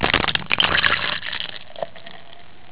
beer pour

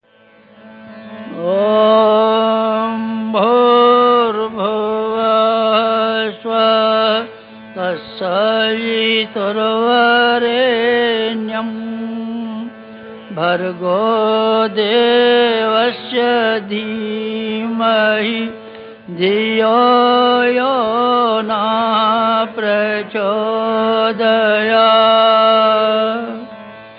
gayatri mantra one time

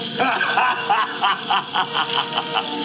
laugh; arnold

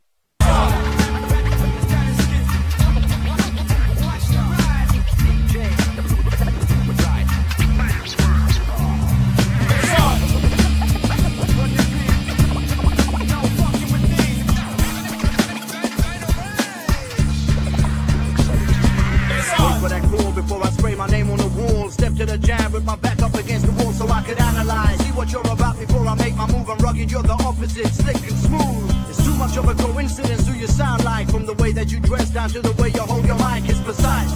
strings

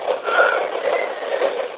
whistle; evp

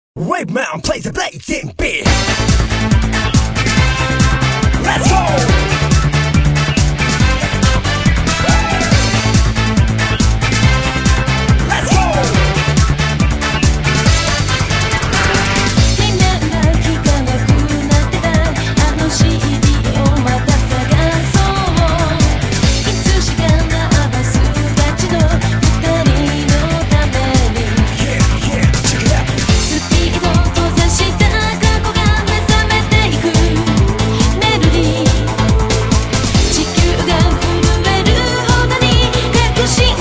Blazin Beat

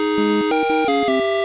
Win Level; Super Mario 2

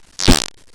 fart

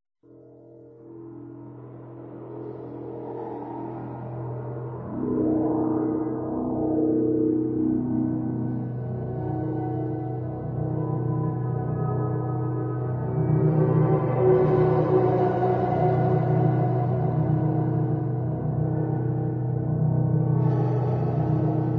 drowning

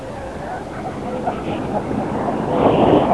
laughing

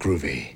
groovy

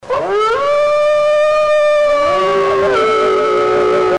wolf

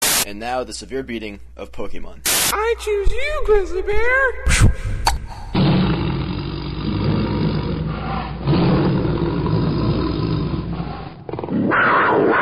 beating

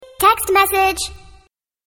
text message

